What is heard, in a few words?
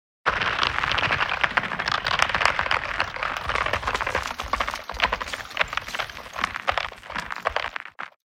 collapse